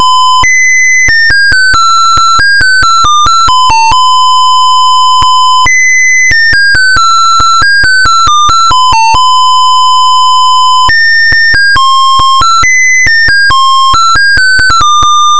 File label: melody